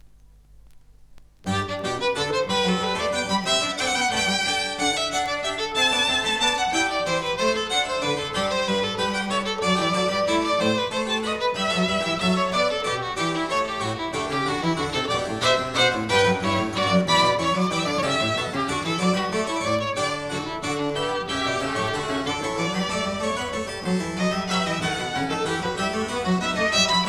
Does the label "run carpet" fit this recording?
No